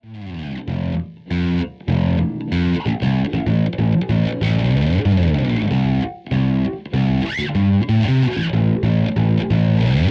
Zoom B2 Four Djeni

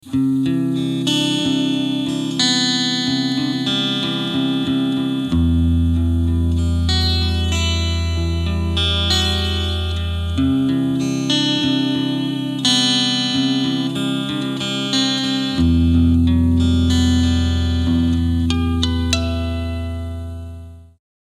Acc Simulator

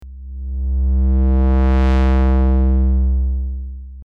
HY Filter; Brainworx

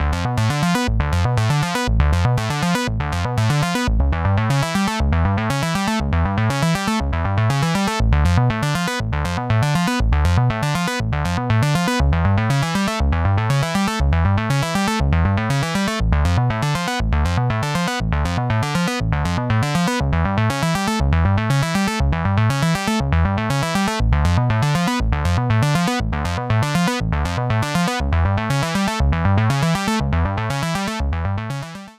WAVES Element 2 Arpeggio E2 E1

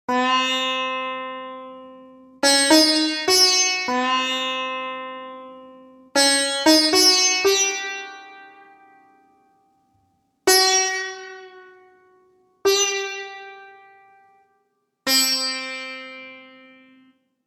India Sitar

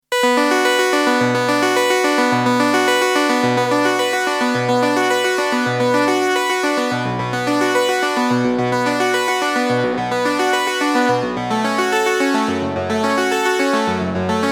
Dimension D Juno 60 Arpeggio

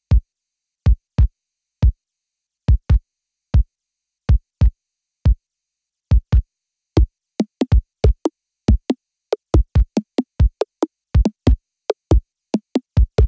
Beat

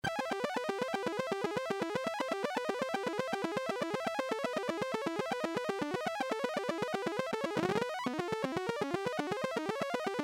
SID Arcade

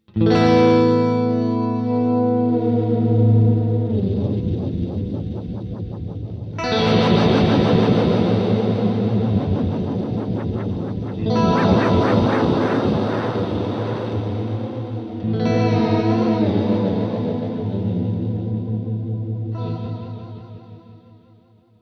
LFO HI Turning Knobs; SPACE BENDER x10

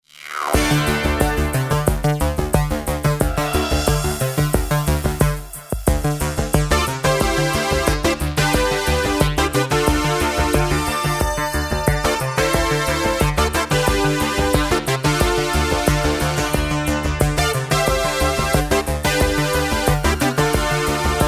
Synthex

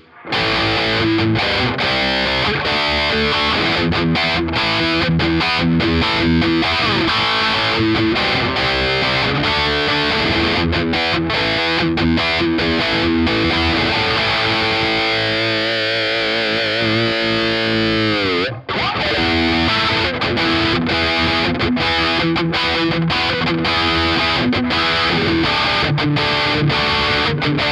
Highgain Rhythm; Gitarren-Plug-ins im Vergleich; Genome

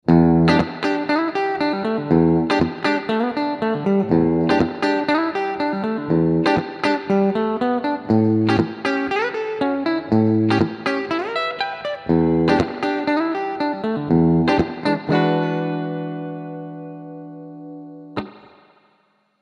ESP Phoenix-401 Bridge clean

